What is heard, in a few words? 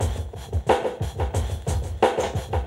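back beat, hip hop; LiveAct Pool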